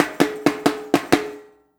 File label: FILL, Percussion